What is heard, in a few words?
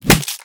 Hammer Small Hit